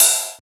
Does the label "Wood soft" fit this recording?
No